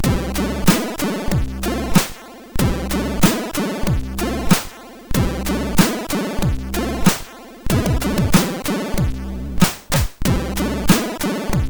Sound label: Live, MOS6581R4